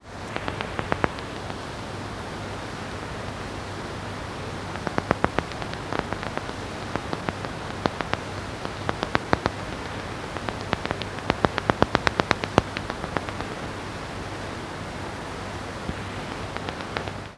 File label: M nattereri; Hte-Vienne; M-Barataud